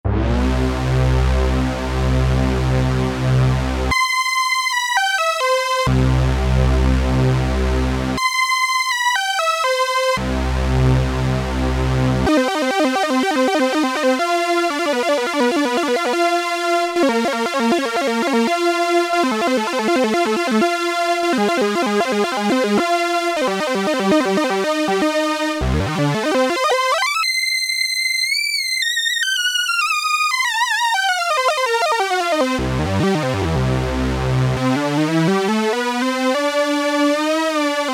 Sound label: METAL SOLO, Oberheim OB-8; Factory Patches